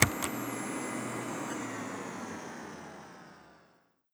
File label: autoturret turn off; Rust